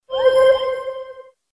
Receive Email

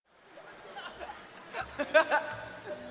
laugh

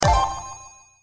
correct

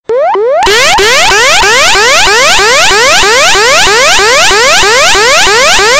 whoop whoop whoop

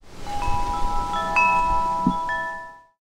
Wind Chime Notes

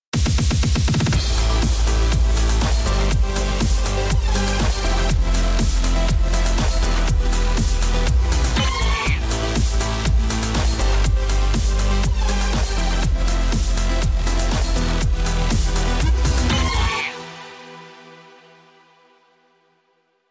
Win; Rocket League

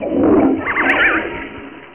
Toilet Flush

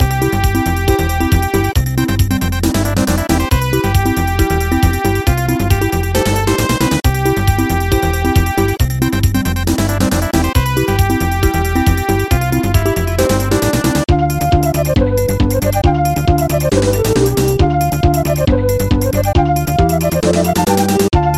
tetris music

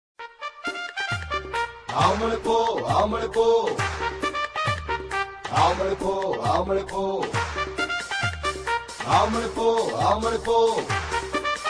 samoan song

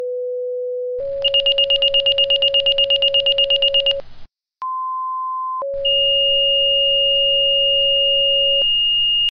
SIREN

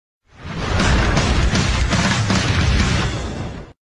rank

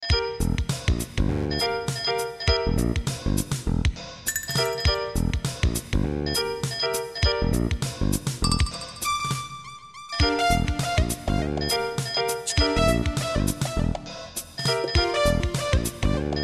Trip Hop

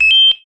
Moving; SMB3

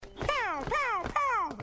Laser Sound

